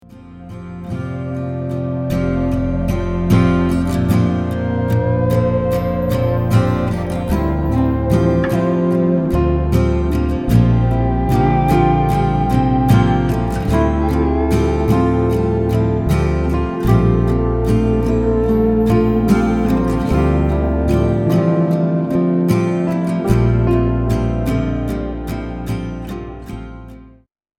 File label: texture; Giver